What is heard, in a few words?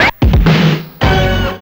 DACK DOO DOO, STABS